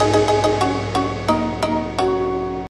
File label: din ding